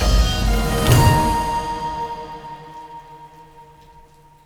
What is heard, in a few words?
Win Sound; netent